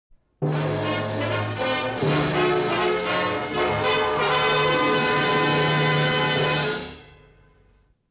drama link

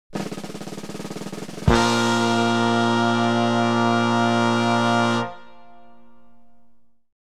slapstick effects

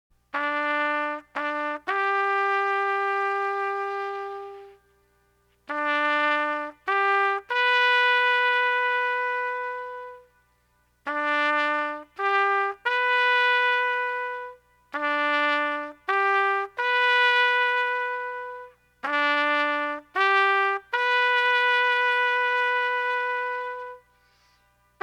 us bugle call

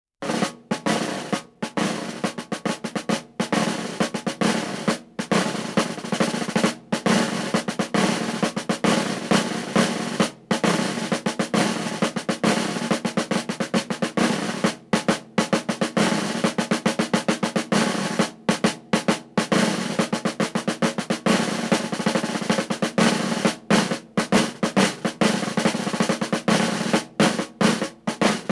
military drum rhythms

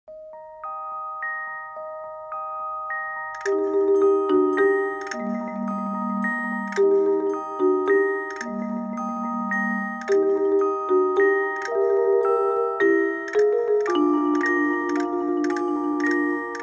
lullaby

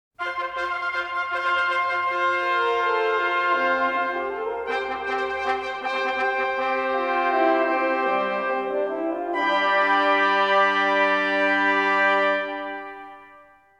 historical fanfare

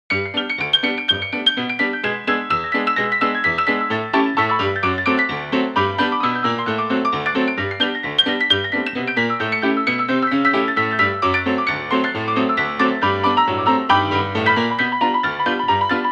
honky old tonk

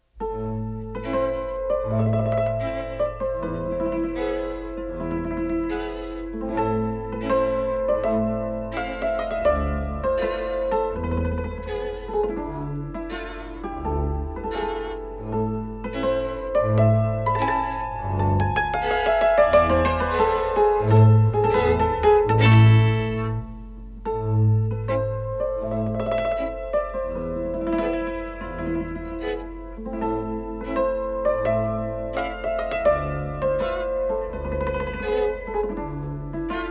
court music

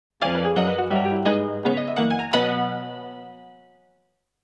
piano flourish

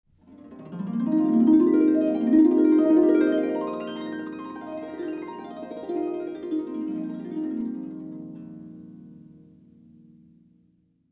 harp glissando

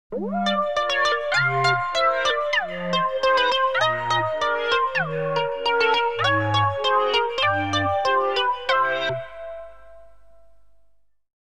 animation effects

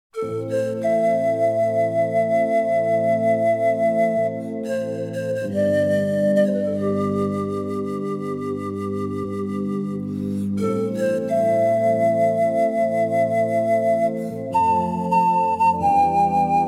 scenic panpipe

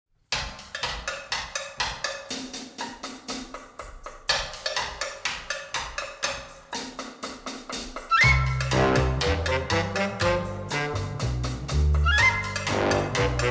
cha cha comique

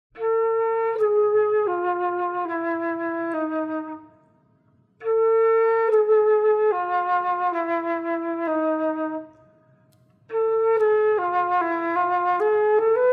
flute; pagan

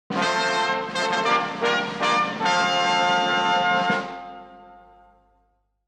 cabaret fanfare

